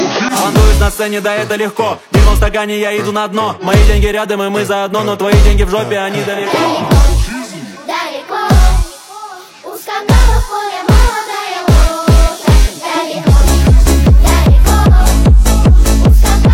Techno Remix

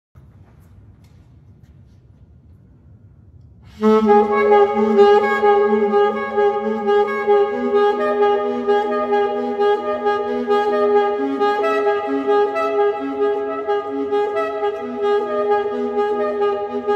Echo Sax Ascent

